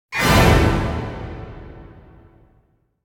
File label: Symphonic Hit Horror Appearance Emergence Chord; Hollywood Edge; sound designer's toolkit v2